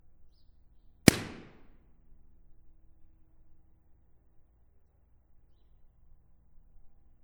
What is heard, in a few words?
reverb theater; pomona